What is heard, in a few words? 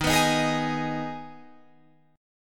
Eb Suspended 4th Sharp 5th, Guitar Drop D; strummed; 13,14,13,13,16,16